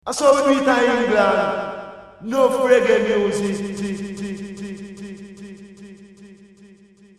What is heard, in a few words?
Nuff reggae